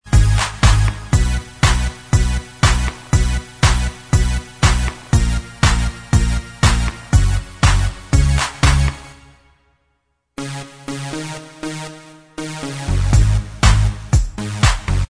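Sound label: instrumental, backing track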